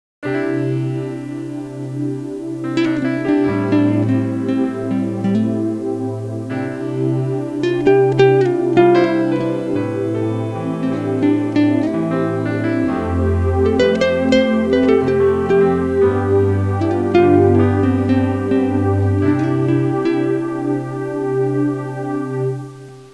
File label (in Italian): suonerie musica jazz